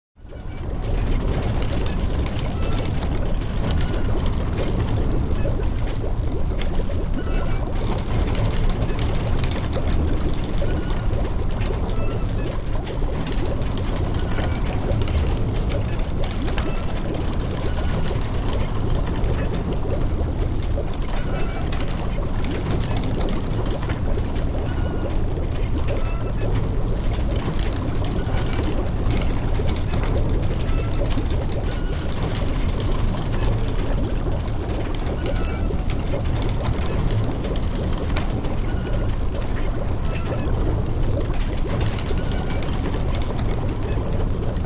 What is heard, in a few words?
Ambience Mad Scient